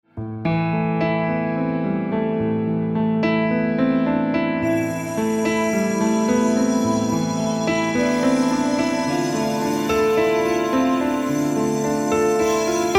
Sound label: Glassbell; vs NI Noire